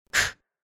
blockstun

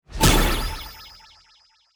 meter burst

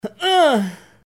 fist

